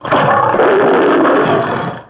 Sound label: Tiger Growl; Playable Tutorial Level; TR2